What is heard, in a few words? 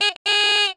Klaxon